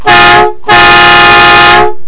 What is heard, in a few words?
big truck horn